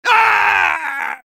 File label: soldier pain severe; vo